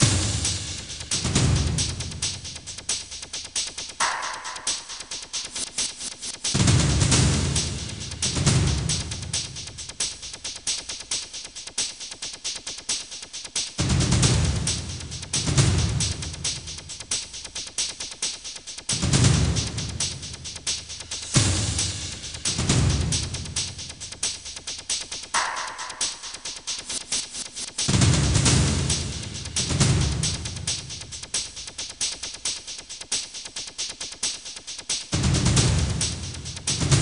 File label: ALERT MODE PERC; Headhunter; dreamcast